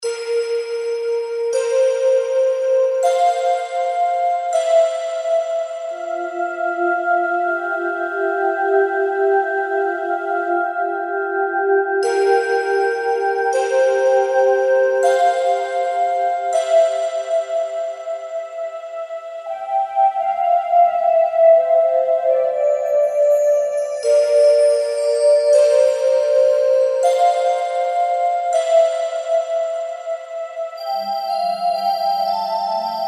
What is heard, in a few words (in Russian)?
zvuki rosy